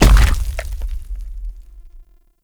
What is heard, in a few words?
Infested Step Default, Enemies; Warframe; Femur